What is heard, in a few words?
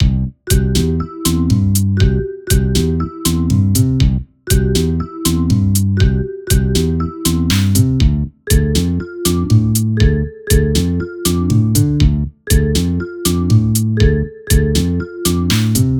BGM; RandomGFGoStop